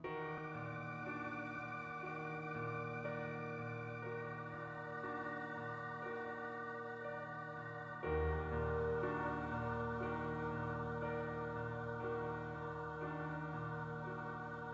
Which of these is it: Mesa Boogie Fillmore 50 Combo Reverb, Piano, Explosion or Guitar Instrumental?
Piano